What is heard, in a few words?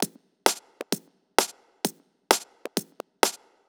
Just Add Kick, 130 BPM, Percussion; Sonic Foundry (Sony Creative Software); Underground UK House & Electro